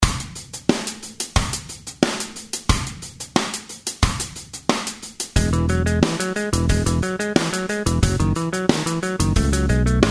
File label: fungrass 90bpm